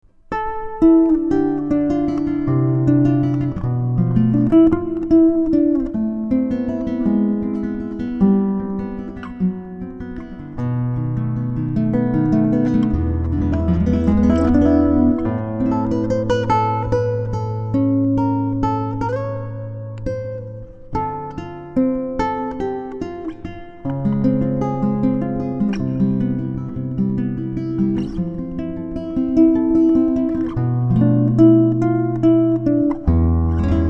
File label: solo clasical